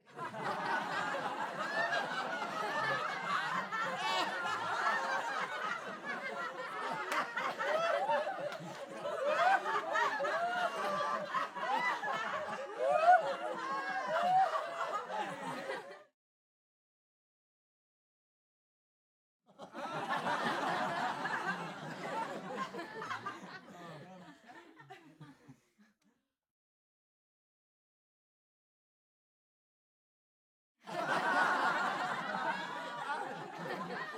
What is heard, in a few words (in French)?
PUBLIC FOULE RIGOLE RIRES